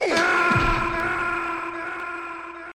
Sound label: Snake death shout